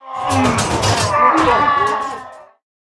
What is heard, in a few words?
Watch Post Attack; Mor'shan Watch Post